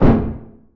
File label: Aircrafts P-51D Cockpit Gear Door Broken In; DCS